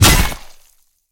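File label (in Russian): точное попадание стальным мечом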